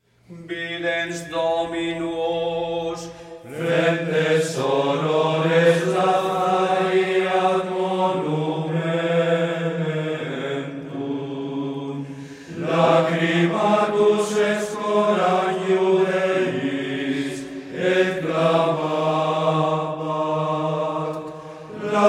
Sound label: singing styles